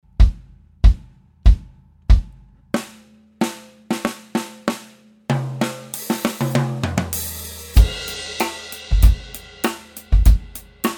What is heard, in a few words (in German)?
Overhead und Bassdrum bearbeitet; DF-Nord Drums Handyrecorder